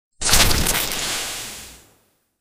a crunch with a splatter

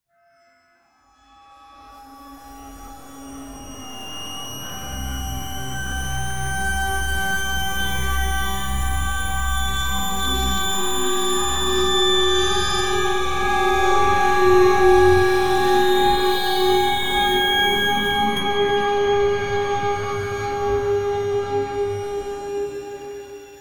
futuristic

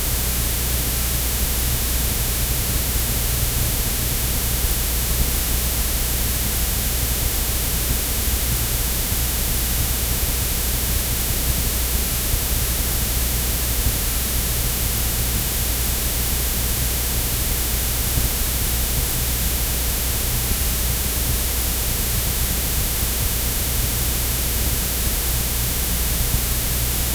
soft tape hiss analog tap; lo-fi

